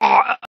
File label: high damage death